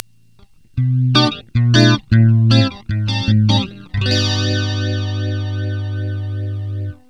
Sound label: chorus